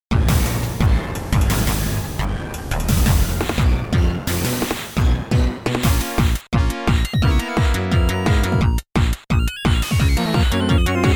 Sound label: Sega Genesis Remix; Pokemon Sword Shield; SupremeMIDI